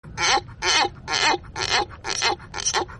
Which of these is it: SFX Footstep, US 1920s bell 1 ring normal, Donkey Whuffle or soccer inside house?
Donkey Whuffle